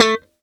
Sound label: a4 staccato, glowly bass